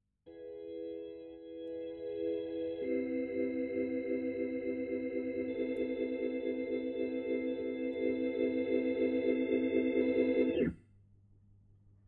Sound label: Hammond